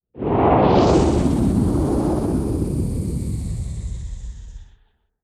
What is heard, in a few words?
organic texture whoosh; Almost Maine